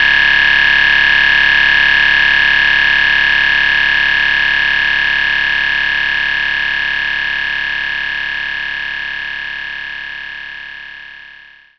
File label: guiro